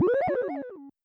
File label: Arpeggio; Inkopolis News